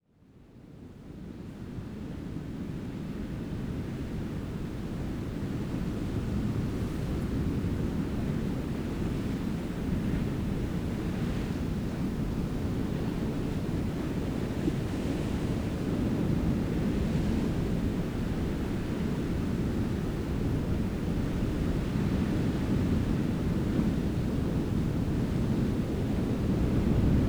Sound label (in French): Océan Callao Salvaje Ténérife; Espagne